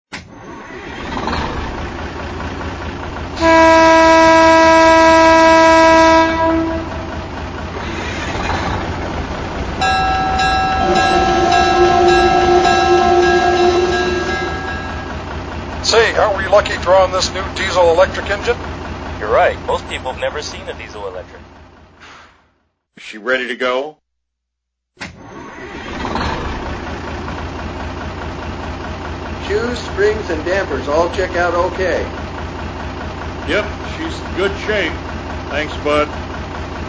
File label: Box Cab